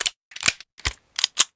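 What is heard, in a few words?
Weapon 127mm jam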